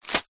office document folder wallet plastic set down or drop